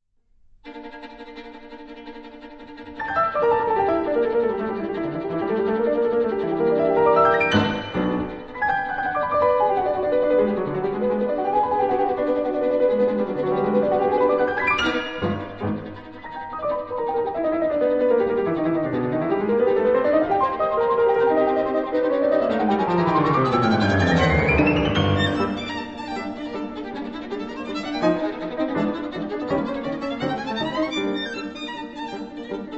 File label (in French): Sonate violon piano; Sonate, violon et piano (Paris : Heugel, 1927)